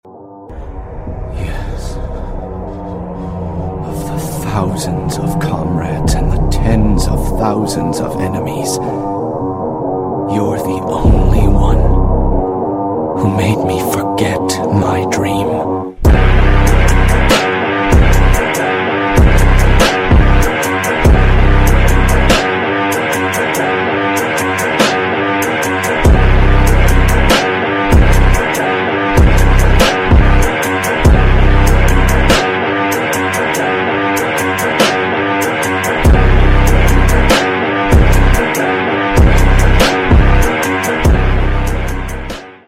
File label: Phonk; ringtone